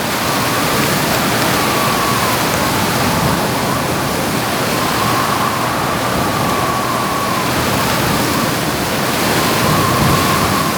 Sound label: wind strong